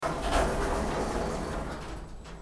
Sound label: lift; cstrike